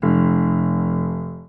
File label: piano f1